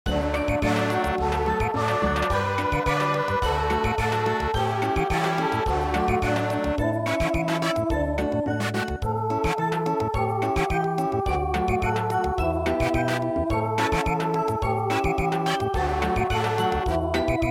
Samba

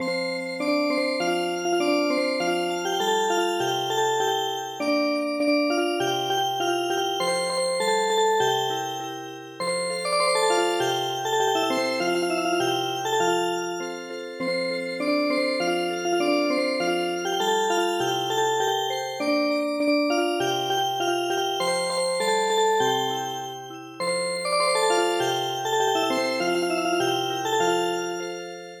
Music Box, traditional

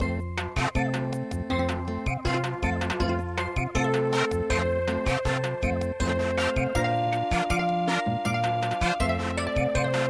Samba, traditional; midi

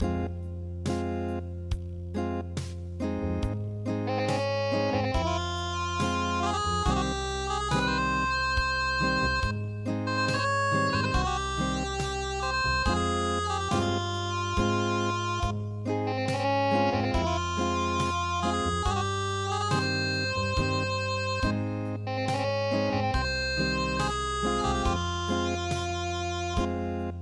Unplugged

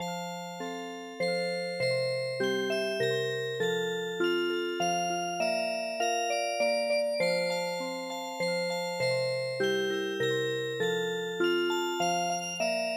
violin player Music Box

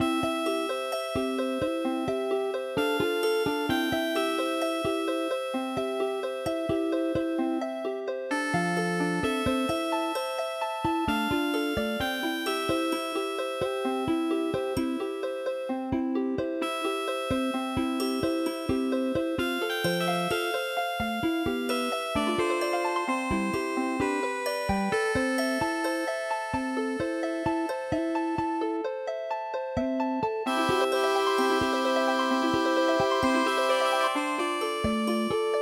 Deep sea drifting; midi; traditional

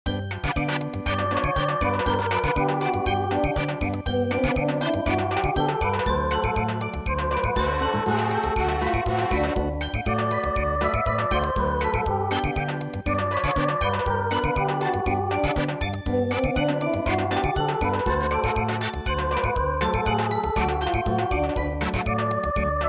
Mexican hat dance Samba

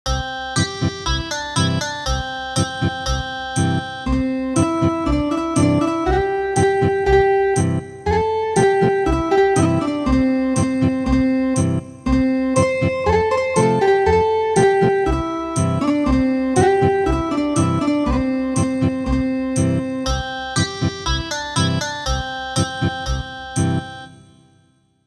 Ethnic Guitar; The Fishermans Song

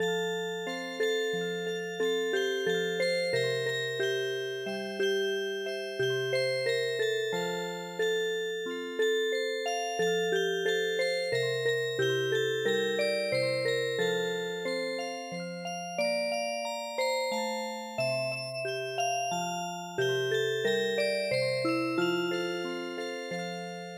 vienna waltz Music Box; Du du liegst mir im Herzen

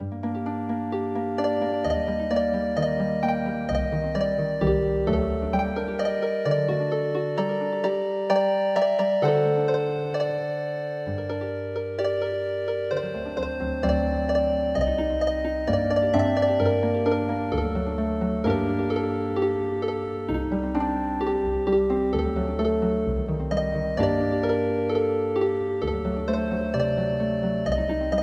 oedo nihonbashi Healing Harp

